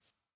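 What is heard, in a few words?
chat window add conversation; lol social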